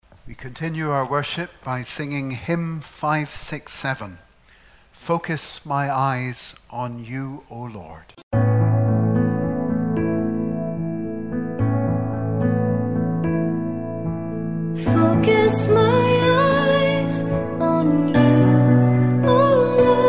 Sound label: hymn; Hymn 567 - Focus my eyes on you, O Lord